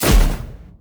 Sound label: Explosion Fire; KriptoFX; Realistic Effects Pack v1; PARTICLES